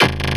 Ultraviolent, Kick Drums; Zero-G; Soundclash

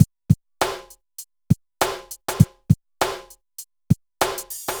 BPM100 SYN

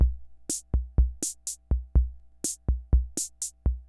Ace Tone Rhythm Fever Loop

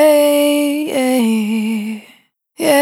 Vapor Dry, Single Voice Melodic Loops; Pocket Diva World Class Vocal Samples

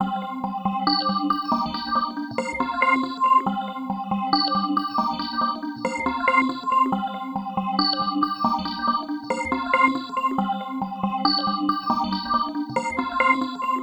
Arp; Sonic Foundry (Sony Creative Software); Slow Silhouette

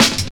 Ruffige Cut, Jungle, Drum Loops 165.5; Zero-G Jungle Warfare 1, 2 & 3

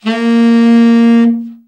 TEN SFT, SAX; Best Service Gigapack 1; BR SAX 3

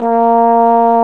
BONE, Trombone; Solo Brass

